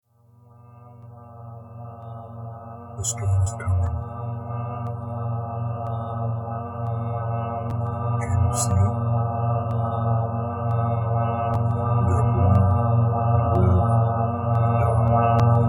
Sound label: storm